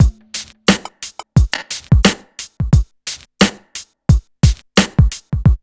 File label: beat, hip hop; USB Soundscan vol.19; AKAI